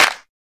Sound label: short reverb clap g sharp key, Royality free hand clap tuned to the G# note. Loudest frequency: 2599Hz; single hits one shots